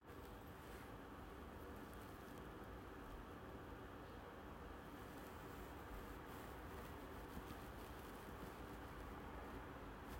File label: cat